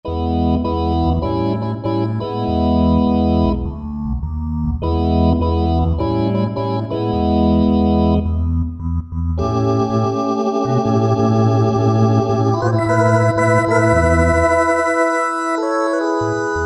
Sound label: LESLIE; Akai SG01v; MWHL LESLIE2